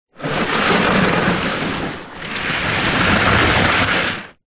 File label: foley container tub plastic pull drag scrape concrete light grit